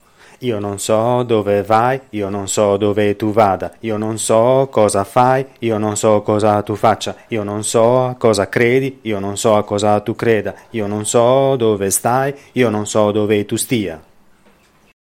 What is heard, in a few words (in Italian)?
rap presente indicativo congiuntivo